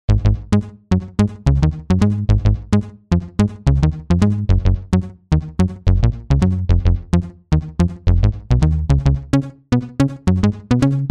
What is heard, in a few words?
s bass; HALion6 : Synth Bass; Dance Bass